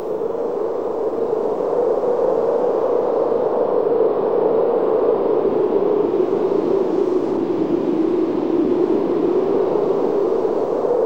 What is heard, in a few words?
WINDFX; Best Service; Advanced Mega Effects